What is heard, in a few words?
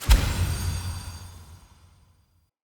UI Default Conversation Menu Select